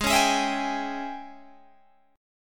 Ab Major 7th Suspended 4th Sharp 5th Ab down Guitar Open G